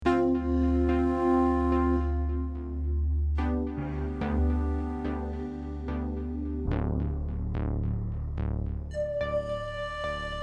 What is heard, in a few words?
pulse